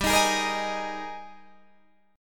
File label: Ab Major 7th Suspended 2nd G, Guitar